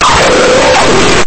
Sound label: kick